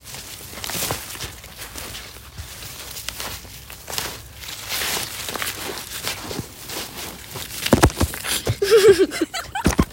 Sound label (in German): kinder gehen im wald; waldnasen geraeuschesafari